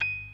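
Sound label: PERC G sharp 4; Best Service; Advanced Media Trax Modern Composer; B3 FULL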